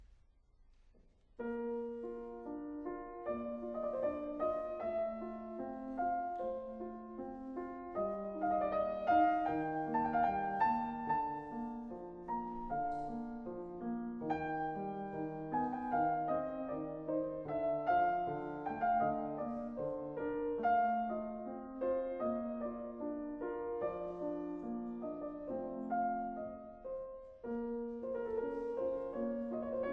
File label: Sonate F-dur; Mozart; KV332